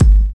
original kick drum g key, Royality free kick drum tuned to the G note. Loudest frequency: 222Hz